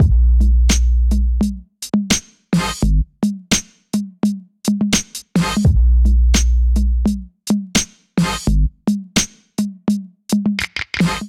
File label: Beat 85 BPM, Drums; MIDI Clips; Buttermilk; Ableton; Chop and Swing